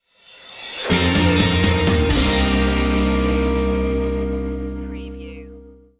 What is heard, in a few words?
sugar coated stinger